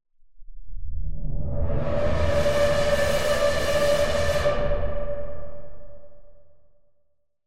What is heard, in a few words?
Pad; Routed Sound; Sylenth1 Expansion - Chaos Bringer Vol.1